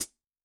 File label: Pedal, HiHat; Yamaha DTXpress-II